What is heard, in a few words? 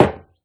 Step Metal Refined